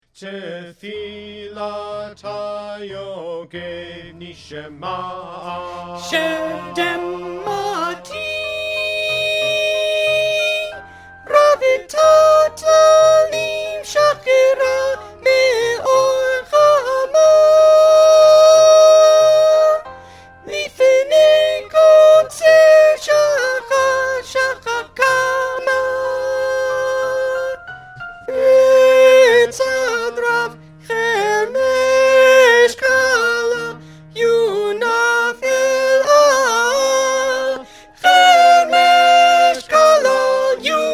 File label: soprano